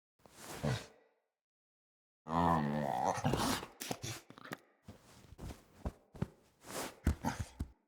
Wake Up Tent Cub SFX

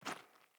Boot Walk Gravel, Footsteps; Characters; Enemy; Boots; Sinecure; Melee Grunt

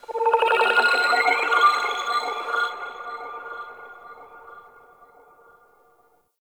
Grain Creep Bird; Warframe; Tau

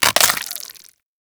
Inf Uzi Mech; Infested; Weapons

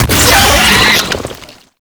Pain, Vocals; Warframe; Proto Infested; Brachiolyst

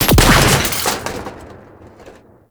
Drone Explosion; Warframe; Venus; Venus Drone Death Explosion

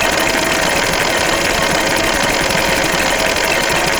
Medusa Puzzle Orb Shot Loop; Duviri

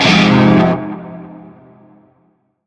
Shawzin Phrygian Chord G; Warframe; Narmer

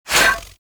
Disarm Dart Shot; Warframe; Pacifist

